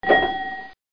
coin

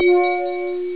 Mail Notify

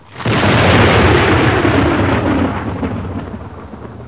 SOUND LIGHT; PC Gamer 4.2; mtm2demo

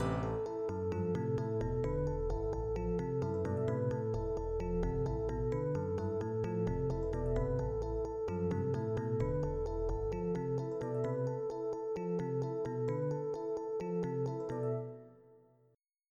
vamp; SMUS Examples

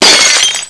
bottle crash; Curse of Dragor

